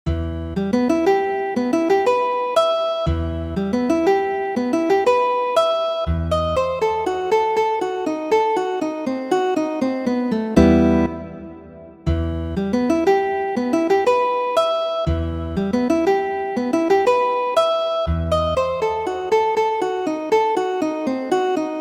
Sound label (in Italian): valses, guitar